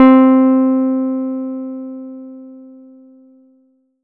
Korg 01W Jazz Guitar C4

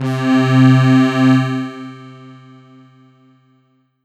Roland D-20 Brassy Vox C3

